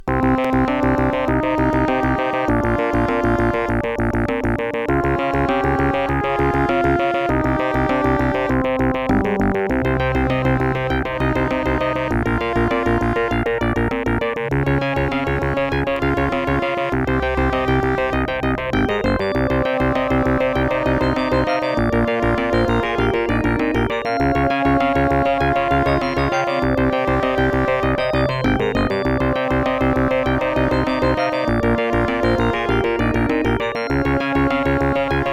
intro, Creative SoundBlaster 2.0 ct1350; soundcard test; Hocus Pocus

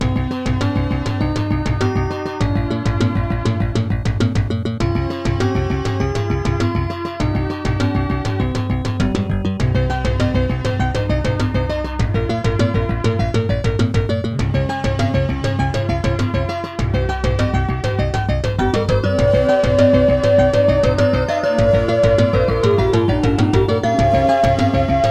intro, Gravis Ultrasound PNP GM emulation megaem; soundcard test; Hocus Pocus

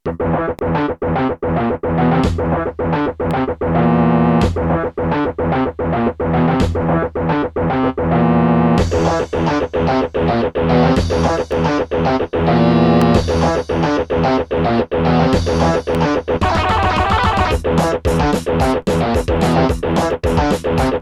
gus pnp; Gravis Ultrasound PNP (classic patches); soundcard test; Doom

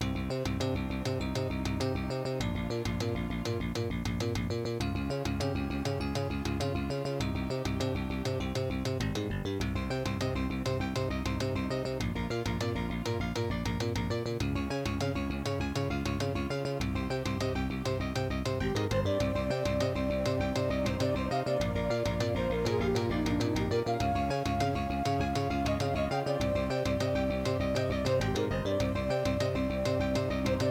intro, Ensoniq Sounscape Opus; soundcard test; Hocus Pocus

